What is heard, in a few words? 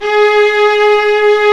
String Vlas Sect Agitato; String Master Series; Vlas Sect1-2